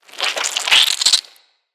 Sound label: Acid Squelch; Ambience; Bromius Inside